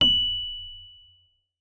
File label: RHODES MK1M F#7; Xsample VOL-1